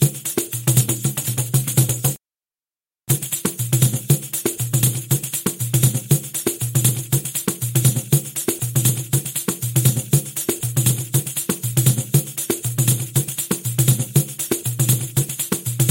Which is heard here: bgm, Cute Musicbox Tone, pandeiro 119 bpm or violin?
pandeiro 119 bpm